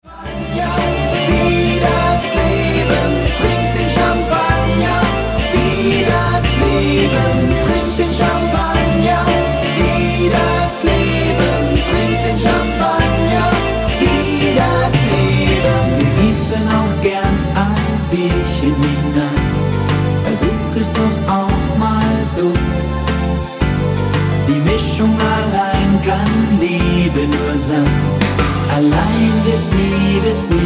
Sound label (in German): mit Chor